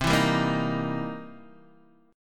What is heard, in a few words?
C 7th Sharp 9th, Guitar, DADGAD